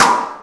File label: Ugly Acoustic, Snares; Drums